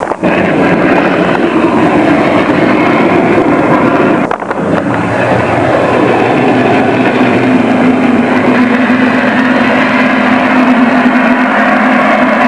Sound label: vortex